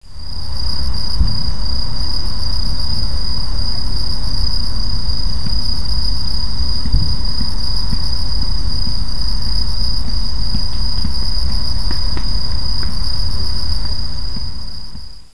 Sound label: night